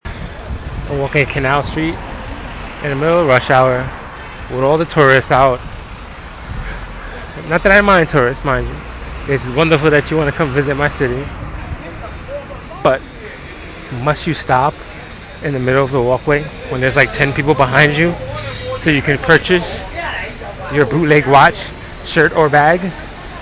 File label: canal st crowds